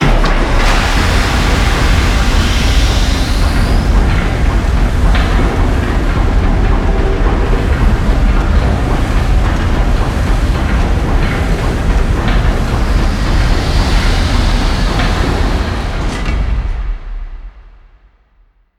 Elevator

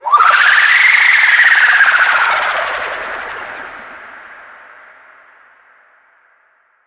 Pain Scream

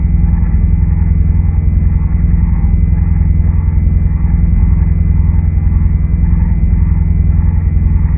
Baking Ovens Loop

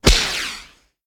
Fireworks Launch

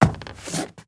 Soft Wood Turn; moul

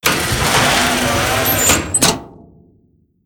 Hatch Close

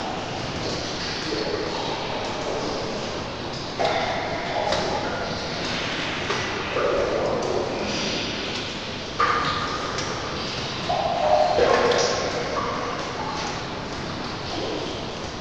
Amb loop; moul-assets

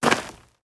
Dirt Walk

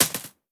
Bskt Stn Hit

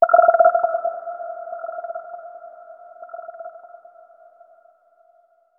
Forest Amb

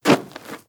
Dirt Turn

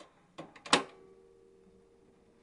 Needle; moul-assets

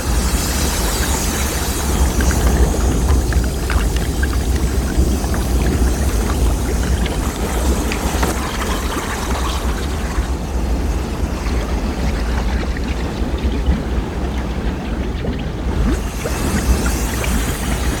Steam Vents Loop

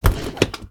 Harvester Dir Lever Up; moul-assets

